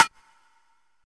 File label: dirty acoustic snare sound A key, snare tuned to the A note